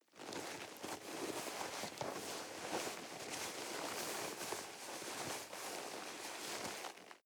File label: nylon leather soft very long